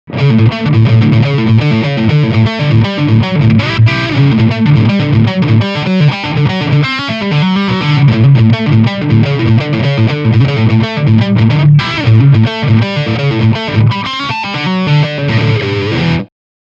rhythm riff

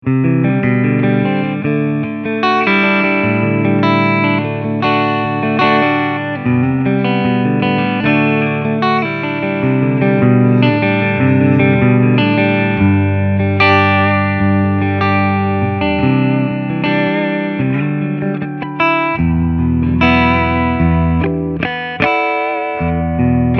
Clean Channel

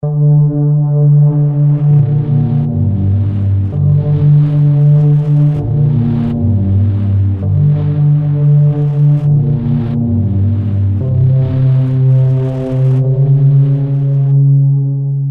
Bass Dirty Tapeheads Macro Modulation; Heavyocity Mosaic

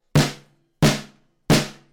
Snare

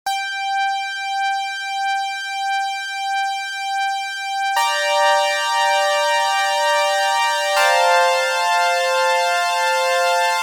Strings; Xerxes

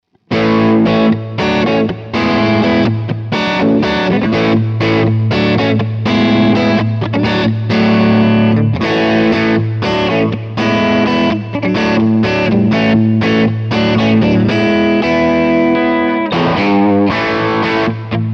Hagstrom Crunch Neck to Bridge

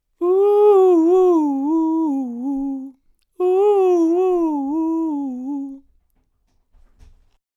OC 818 Uuhs

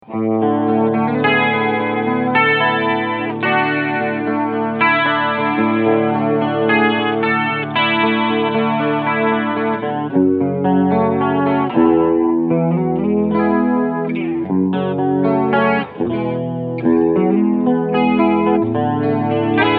Chorus

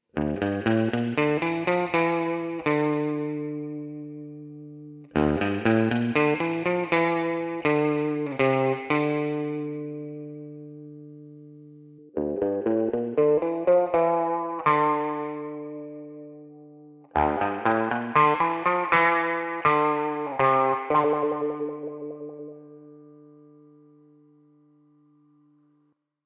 Crunch Spring Reverb Wah

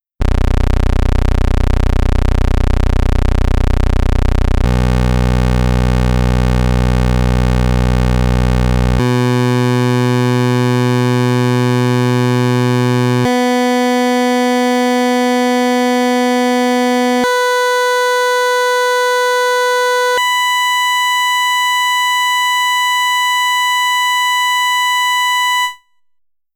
Pulse 2 Osc 1 Pulse oct switch

